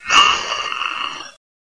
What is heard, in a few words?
slower pain; cof; suicider